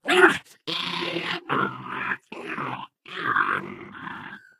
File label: pain wall crash; player